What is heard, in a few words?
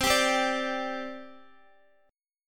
C Suspended 2nd, C, down, Guitar; Csus2; strummed